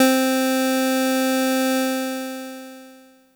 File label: Prophet REV2 SYNTH Nasal High - C; One Shots; Cymatics; Fantasy Synth Sample Pack